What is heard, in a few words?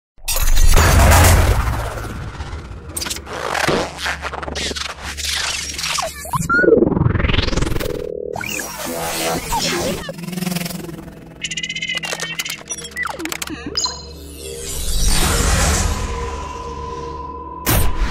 fantastic robot; CYBORG COLLECTION; interface alerts